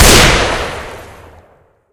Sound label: sniper military fire incendiary; weapons; gunfire